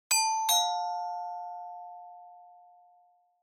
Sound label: Doorbell Fake